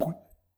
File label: plop, bubbles